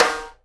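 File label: SIDE STICK, Live Snares; E-MU Producer Series Vol. 8 – Platinum Phatt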